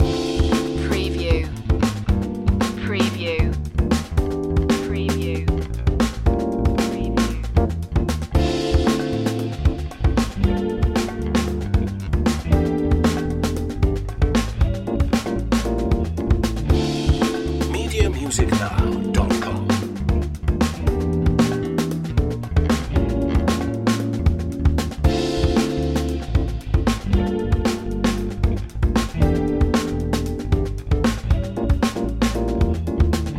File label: high funk loop